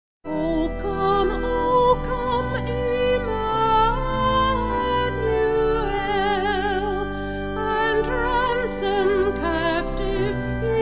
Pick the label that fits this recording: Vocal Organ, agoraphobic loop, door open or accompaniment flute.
Vocal Organ